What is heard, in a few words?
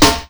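SNARE, Hip Hop; InVision Interactive; 40 Oz Phat Slammin Hip Hop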